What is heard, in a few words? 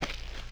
TIRE STEP; Cars; XBOX